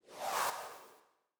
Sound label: Sci-fi Swoosh